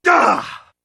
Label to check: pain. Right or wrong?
Right